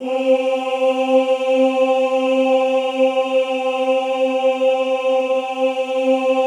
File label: OOH C3, Classical Choir; Best Service; Extended Classical Choir; OOH MIX